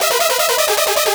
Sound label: custom synth